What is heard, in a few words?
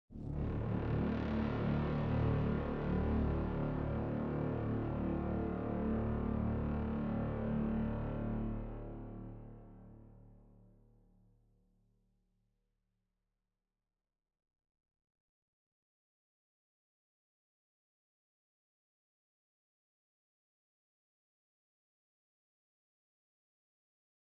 Horn; Frozen Flame; windows